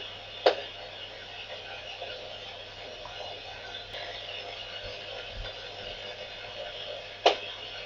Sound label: 100W 530kHz